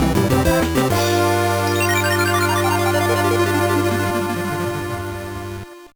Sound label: Unknown Jingle; Sonic Advance 2